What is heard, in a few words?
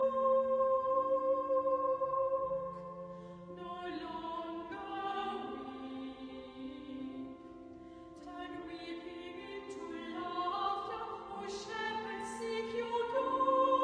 carols